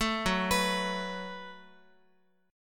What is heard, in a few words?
F sharp Minor Double Flat 5th F sharp, Guitar; arpeggiated